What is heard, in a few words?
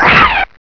snipe bang no zoom, weapons